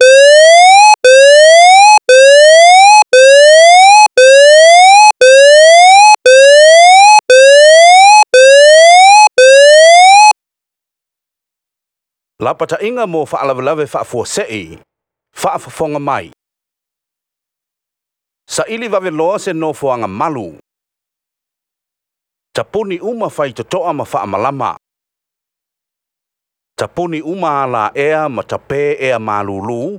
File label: SIREN MESSAGE TAKE COVER; TEMCO